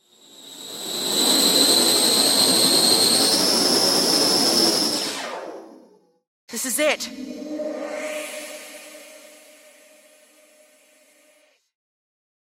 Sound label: Acapella